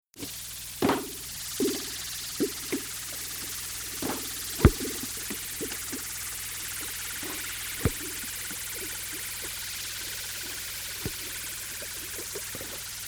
faucet

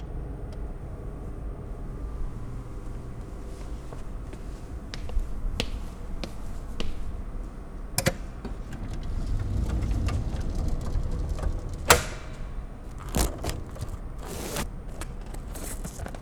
Filing

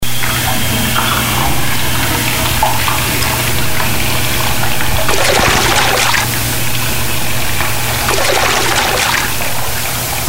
soundscape

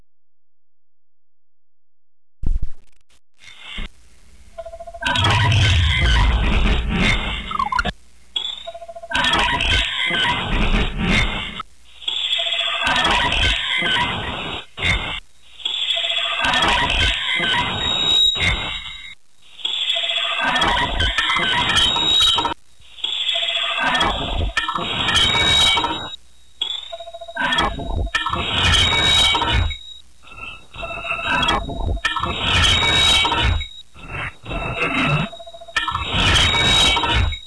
LOOP

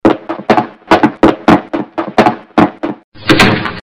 walking out

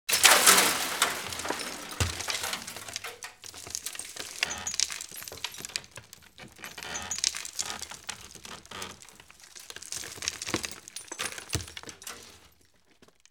impacts light; spill; Asian Show Sound Effects

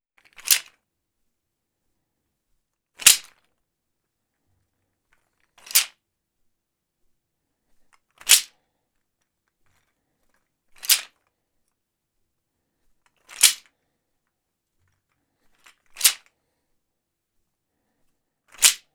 Clacker; Stars (Tachenump)

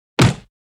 punch body hit; fights bodyfalls; Asian Show Sound Effects

